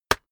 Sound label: keyboard external enter hit push